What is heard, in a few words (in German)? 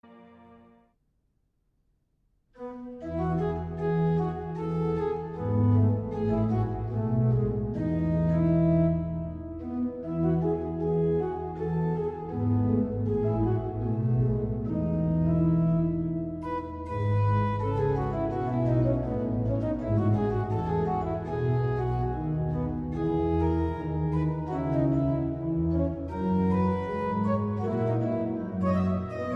Orgel; Gekürzte Fassung; Bach; Wachet auf, ruft uns die Stimme BWV 645